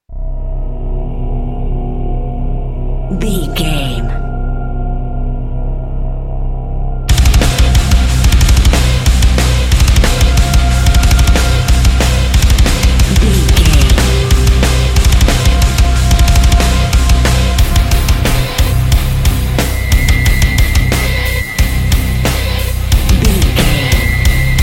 open jaw death

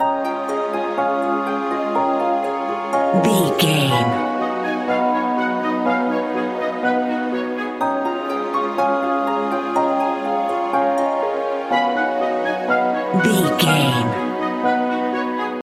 enchanted magical forest loop

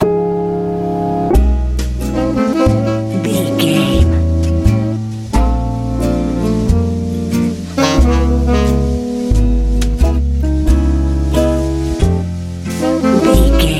jazz ballad

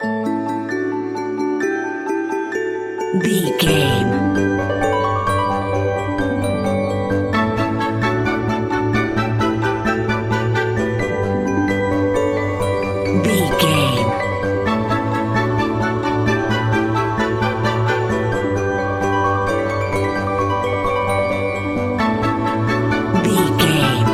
whimsical utopia

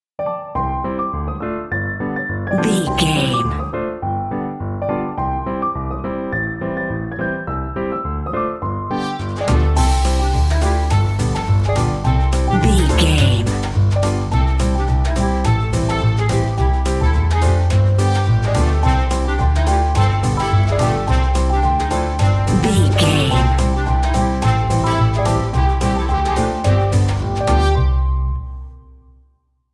playful buzz piano and brass ensemble

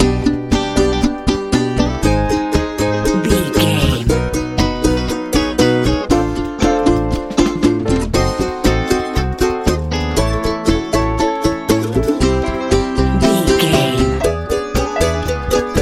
hopeful nice echo head dreams lite pop

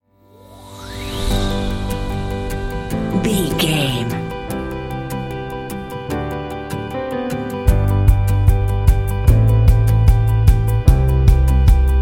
upbeat corporate pop rock

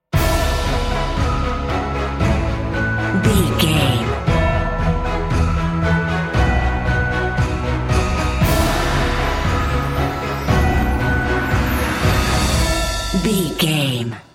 cinematic corporate

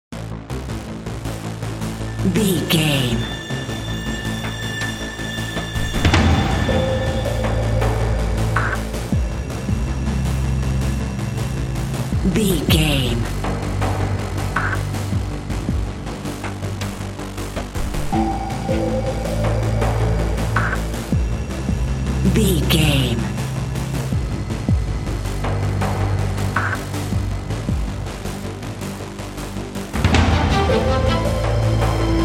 metal

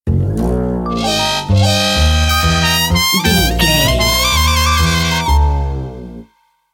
stinger corporate ident logo

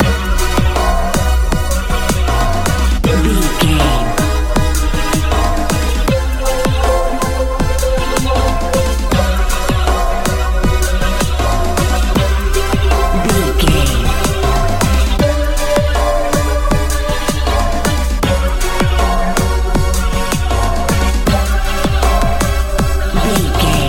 good blooming modern dance

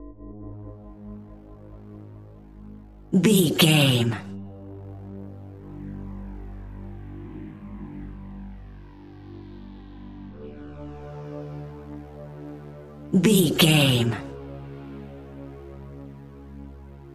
sci fi pack stinger

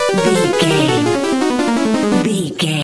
character dies video game stinger

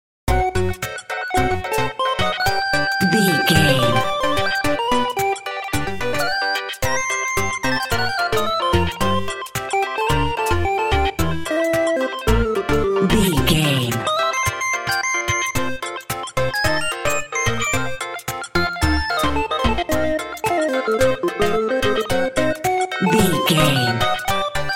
happy video game level

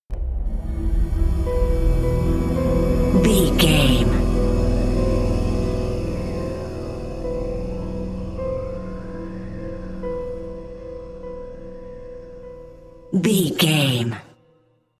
dark desolation bumper

